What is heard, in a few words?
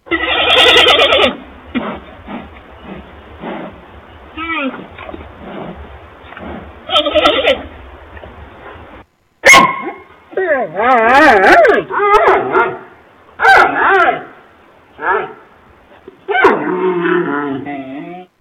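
panda golos, panda voice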